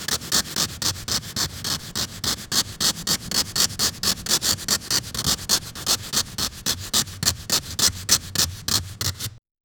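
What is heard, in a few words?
sandpaper scratching metal; abrasive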